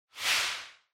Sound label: Camera Motion High; シーン・カメラモーション